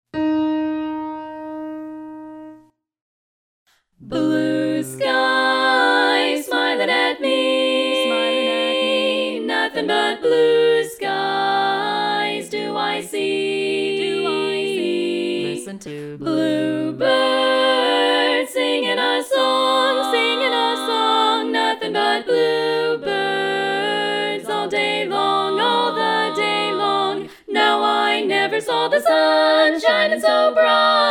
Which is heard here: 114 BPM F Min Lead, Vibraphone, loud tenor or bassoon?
loud tenor